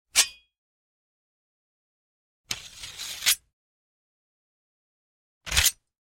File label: Sword Unsheathed; Hollywood Edge Martial Arts and Human Impacts